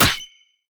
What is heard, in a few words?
heroes broden birds of prey gryphon attack